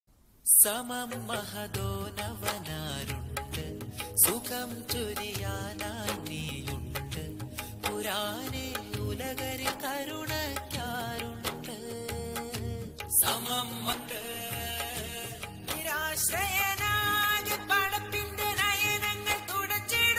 malayalam islamic ringtone

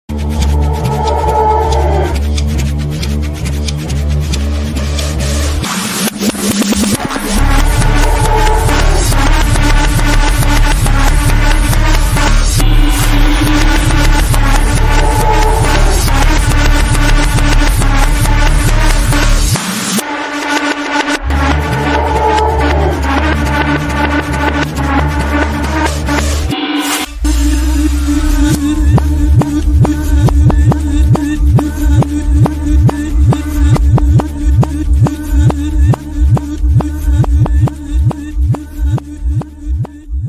reversed bgm; amar s theme; fahadh faasil

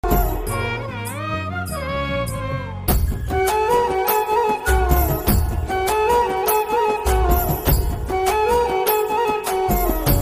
love bgm ringtone; backbencher 2

